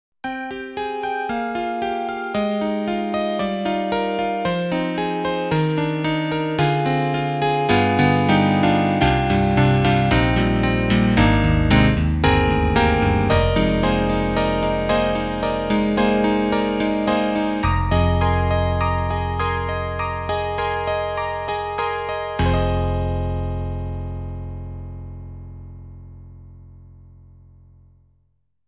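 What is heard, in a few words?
Contemporary E. Grand Piano; SD-90; EDIROL; E.Grand Pf w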